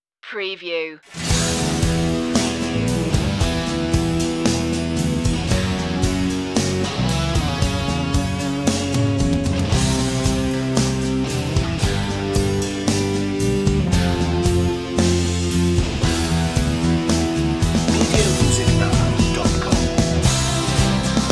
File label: instrumental